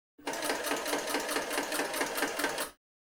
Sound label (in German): entwerter, volvo 9900; IBIS; Freyfurt Beta